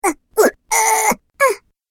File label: Little Minion Voice Exertion Sounds, cartoon voice